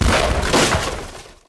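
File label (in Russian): близкий слабый бумажный взрыв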